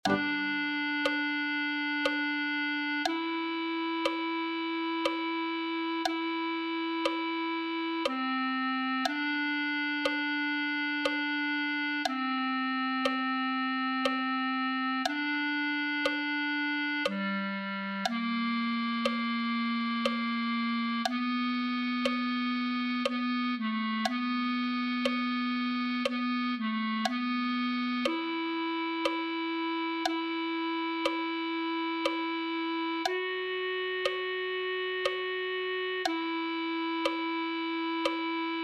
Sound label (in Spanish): clarinet1